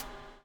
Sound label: BUBL, BUBBLE; Spectrasonics - Backbeat